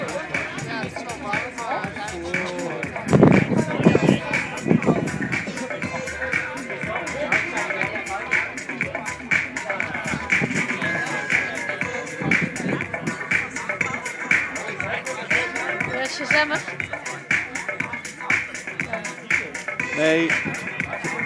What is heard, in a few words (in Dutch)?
muziek; kristall hutte lounge